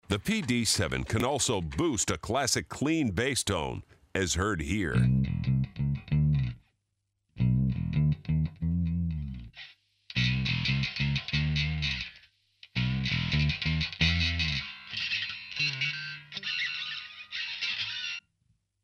fret noise

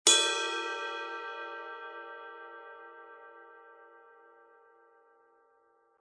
Raw Bell Dry Ride Bell

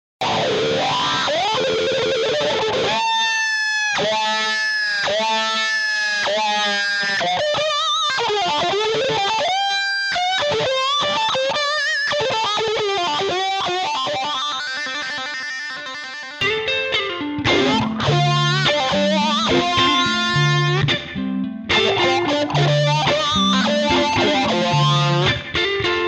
autowah

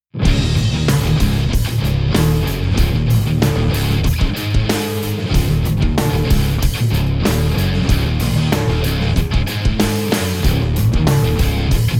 Vox Valvetronix XL; Audio Rage

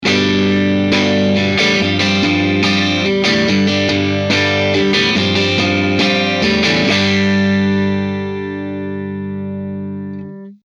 G Rythm Clean; MOLLER

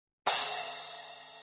Medium

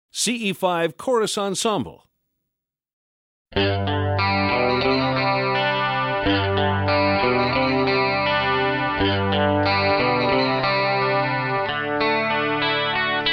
tone demo

